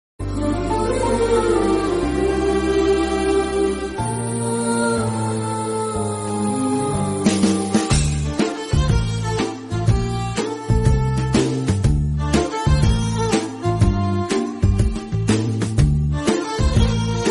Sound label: love bgm instrument; sarocharu movie